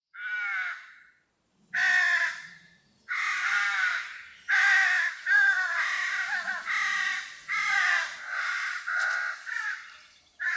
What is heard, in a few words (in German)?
Saatkraehe Corvus frugilegus Einzelrufe